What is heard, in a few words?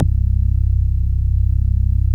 SMACK C1, BASSES